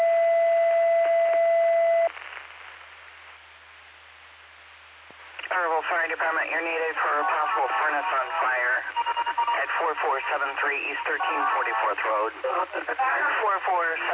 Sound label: Fire; Fire/EMS calls; Earlville; TTDAlerts